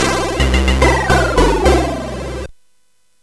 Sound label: Tube, CSG8580R5